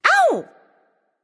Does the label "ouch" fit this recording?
Yes